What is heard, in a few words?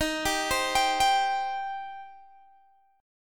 Eb 6th Eb down Guitar Open G